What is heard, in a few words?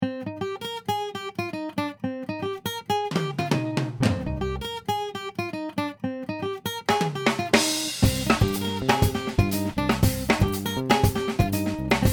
Afro-Cuban